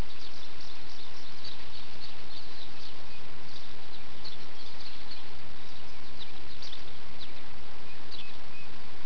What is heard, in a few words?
birdsong